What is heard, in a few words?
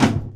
Closed Hats